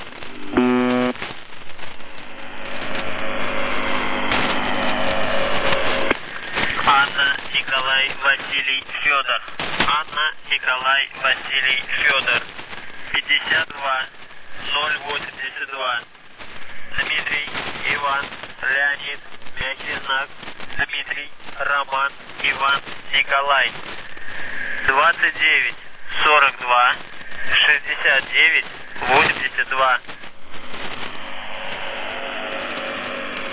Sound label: buzzer usb msg